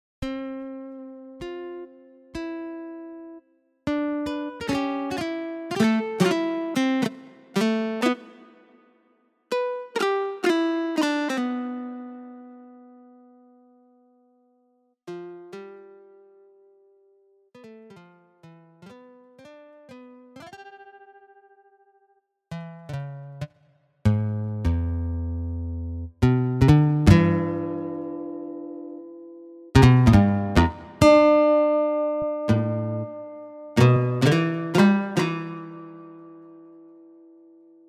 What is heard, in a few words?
Strum GS